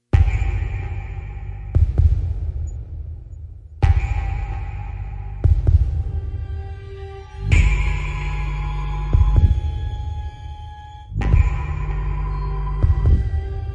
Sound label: Gloom No Rise No Choir